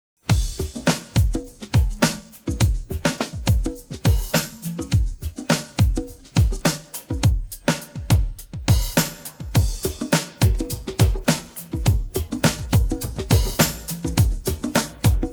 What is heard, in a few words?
drums pattern